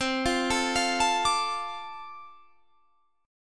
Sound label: F 6th C, chord